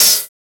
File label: Open Hat; FLPRO